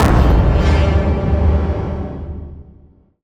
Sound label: impact; Bluezone Corporation; Cinematic Impact Sound Effects; Steampunk